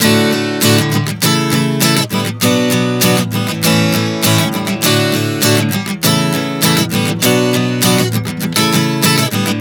Prog 100 D A Bm7 A, Acoustic Guitar, Chord Progressions 100 bpm